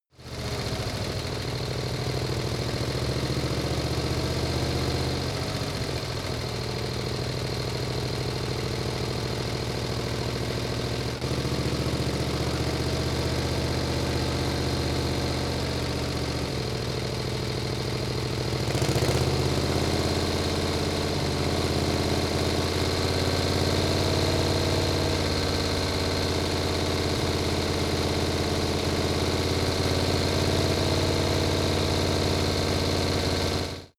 motorcycle harley drive slow sequence

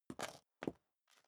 step high heels concrete grit scuff; schritte; Footsteps; menschen

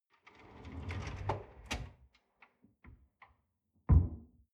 folding door close

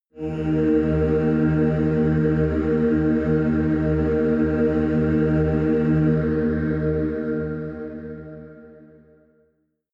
demon choir one note low; music elements

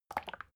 bottle syrup upend soft; berufe; gastronomie

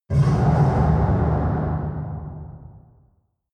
metal scrape large hollow

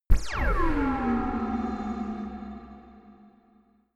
low laser blast shot; soundpool

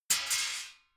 metal sheet crash hard; metall

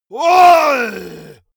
monster growl attack throaty; schreie

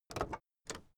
car camaro open door

